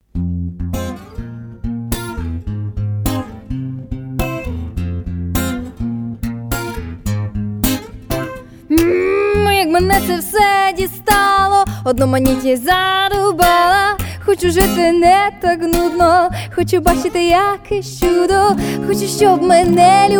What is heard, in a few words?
Piano